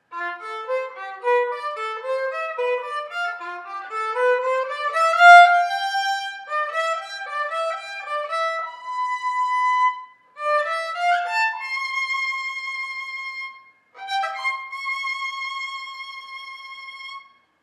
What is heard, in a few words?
Soaring Violin